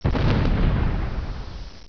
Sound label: EXPLO; LEDWARS